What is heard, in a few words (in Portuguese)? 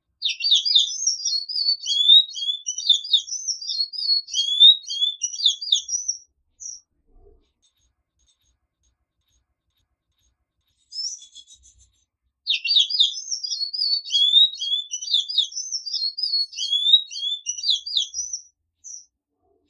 Canto de Sanhaco Azul Canto Sui sui